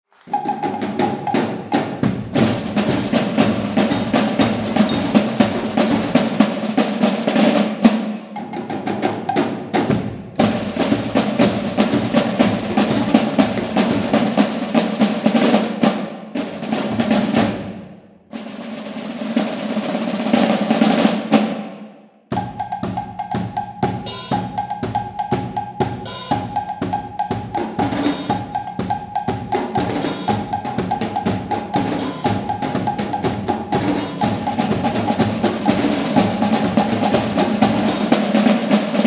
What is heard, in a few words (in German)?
Tambouren Zugabe